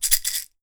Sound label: PEYOTE; AKAI S6000 CD-ROM - WorldSounds